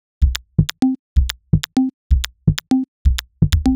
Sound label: Glossy Top C 127bpm, House Drumloops; 90 s Sample CDs; Zero-G House Fabrik Drumloops; ZG Drumloops